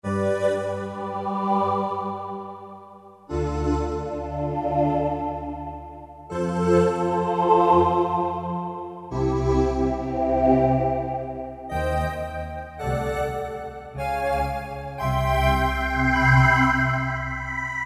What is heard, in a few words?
Stereo Panorama; Roland PN-D50-03